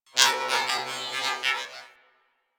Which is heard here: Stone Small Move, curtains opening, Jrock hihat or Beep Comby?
Beep Comby